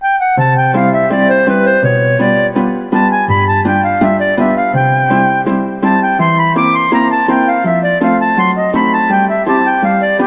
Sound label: Little Melody